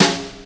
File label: BRUSH, JAZZ DRUMSET